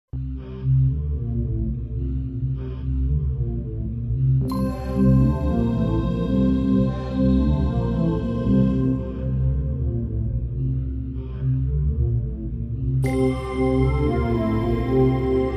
Slow Low Voices Mysterious

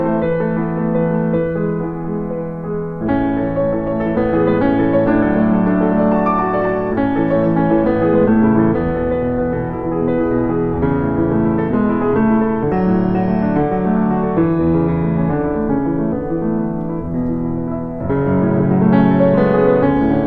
Nocturne

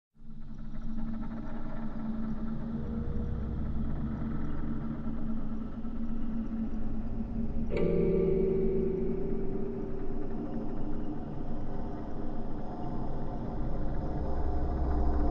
Drones

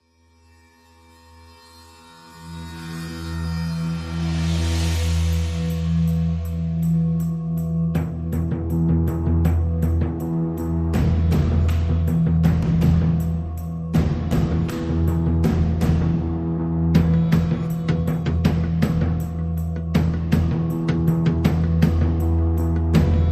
Percussion and Pulses

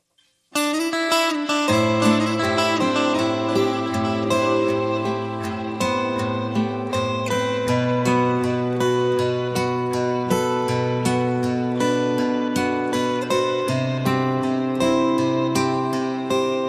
Guitars

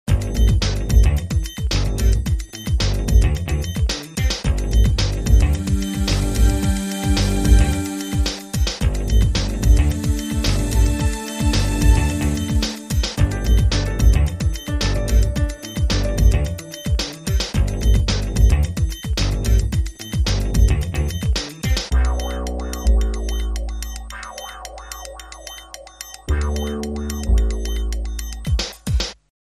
Funky

